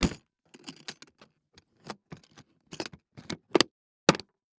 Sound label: First Aid Kit Metal Setting Down And Locking On Concrete; Emergency; AdobeCC